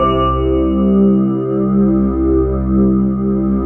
synth bell; VFXSD

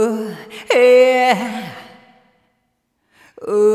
Rhythmic A

